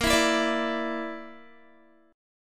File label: A sharp Major Flat 5th, Guitar; A#Mb5; strummed